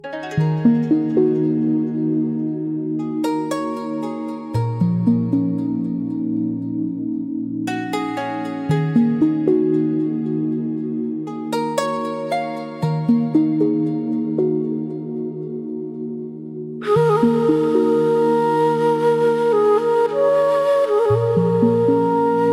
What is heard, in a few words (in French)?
ambiance asiatique, Musique zen asiatique ambiance – Sonorités d’Asie